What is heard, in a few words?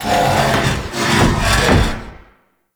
Derelict Elevator Door Malfunction Close; Orokin